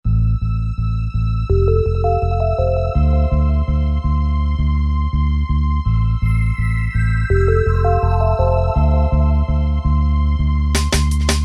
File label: pulse